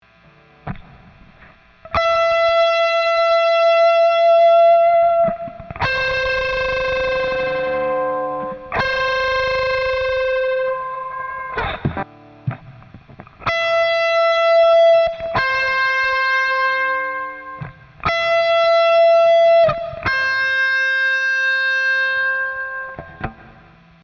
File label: warbling pickup